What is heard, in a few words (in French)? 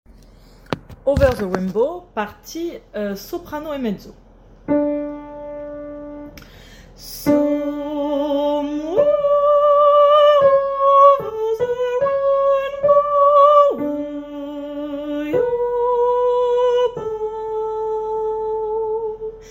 Soprano et Mezzo